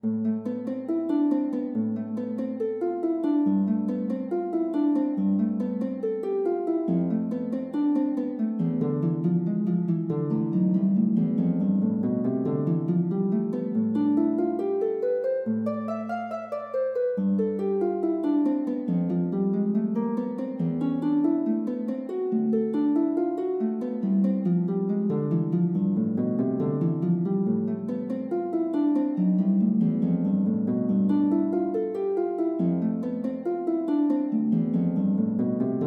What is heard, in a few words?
Prelude, lute